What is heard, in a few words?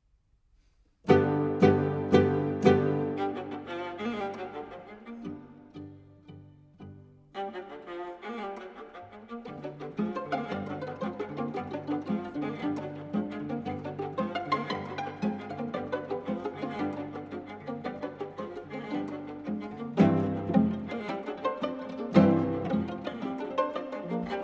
String Quartet; String Quartet No. 1 II. Assez Vif Et Bien Rytme